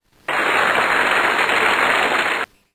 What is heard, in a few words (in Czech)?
Potlesk; Chcete být milionářem 3